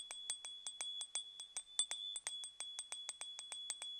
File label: bell